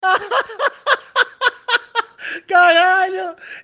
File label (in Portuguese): risada medo e delirio